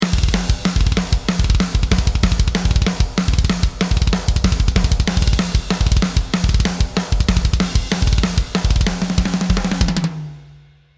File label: Technical Metal Groove 5 - Processed Dry, drums